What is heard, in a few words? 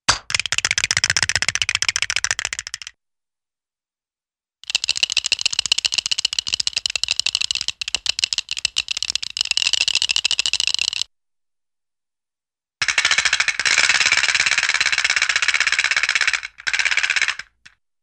Quick Pop Followed By Teeth Chatter, cartoon; Hollywood Edge; Cartoon Trax